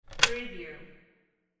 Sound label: phone pick up